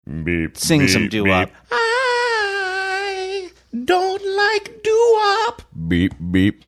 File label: singing beep beep beep; i dont like doowop